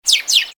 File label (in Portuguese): passaro piando efeito sonoro